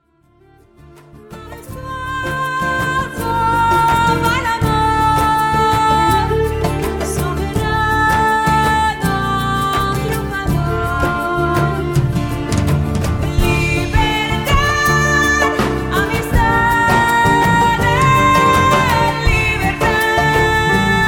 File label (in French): Soprano Voix pleine